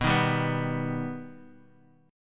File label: B Augmented B down